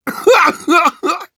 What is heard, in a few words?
Engineer laughshort, ru